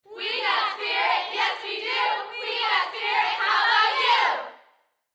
Human Crowd Studio; Crowd Reactions Female Cheerleaders WeVe Got Spirit; Blastwave

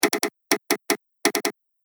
Sos Morse Code; Distress Signal (Help) Sound Effect

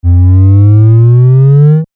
Laser Beam Power Up

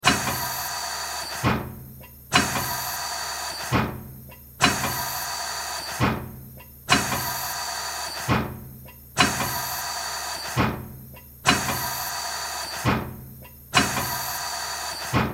Bantam Brake Machine Metallic Pounding Loop; Bantam Brake Machine Metallic Pounding Slow Loop; Machinery; Industry; Blastwave

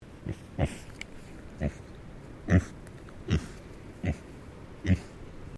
Animal Pig Tamworth Grunt Sequence; Hamish; Edgar's Mission Farm Victoria; Pigs; Animals

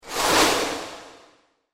Scary Scratch Transition; Scary Soundscapes; Horror; Sound Effects:Horror; CraftedSignals

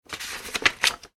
household book page turn; Books (Sound Effects:Offices); McKinney Sound

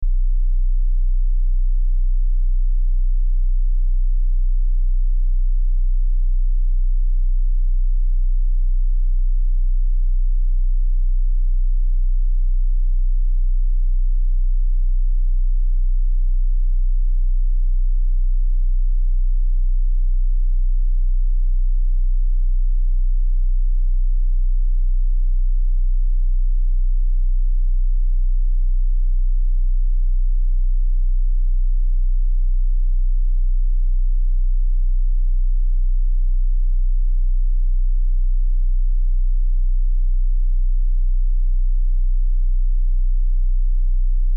Test Tone Sine; Test Tone Sine Wave 31.05Hz 60 Sec -12Db Loop

